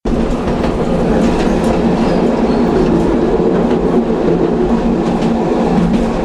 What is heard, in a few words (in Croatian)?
Vlak